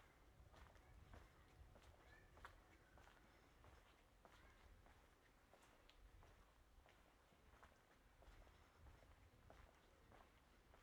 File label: park, scenes; IEEE AASP